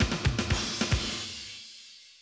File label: drum loop, 100 BPM; Best Service; XXL 1500 DrumLoops; Sample CDs